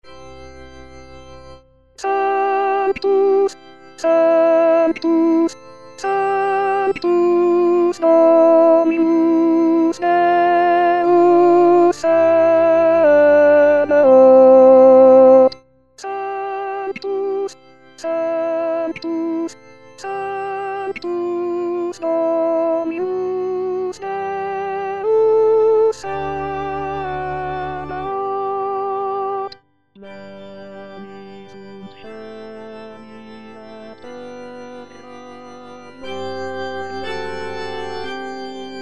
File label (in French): alto sanctus; gounod; messe chapelles